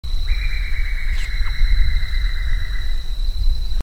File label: Chamaeza campanisoma flush call